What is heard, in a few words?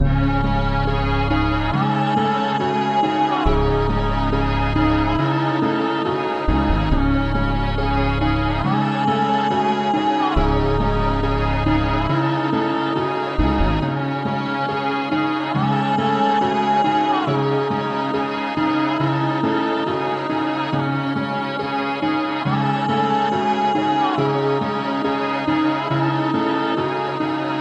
139 BPM C# Min, Melodies, Orchestral; Cymatics; Monarch Melodies; The Mist